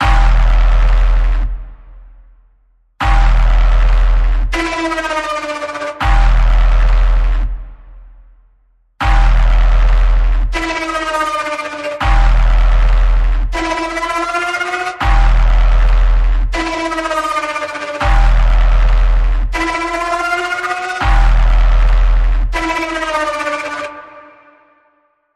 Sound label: Mechanical Massacre Pulse E, Key of E